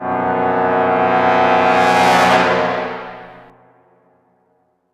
String Medium Brass

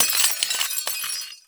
Weapon Orb Break; blastmorph pinball; xbox series xs

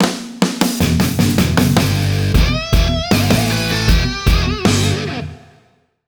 Touchdown; NFL Rush Zone; Leapster Explorer

